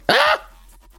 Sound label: ahh